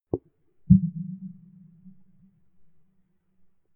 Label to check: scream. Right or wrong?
Wrong